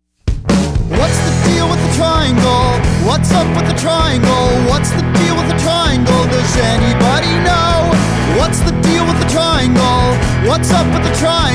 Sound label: triangle